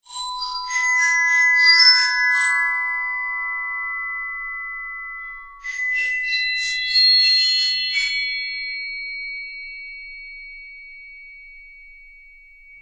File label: Glocs